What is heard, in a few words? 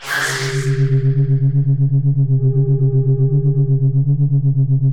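tractor beam negative loop; vfx; Portal 2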